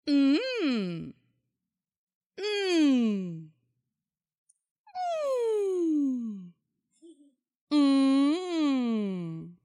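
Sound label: sound of surprise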